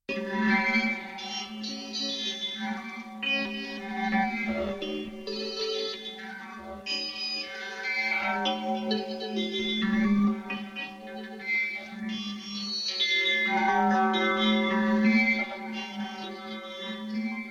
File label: Tuning Fork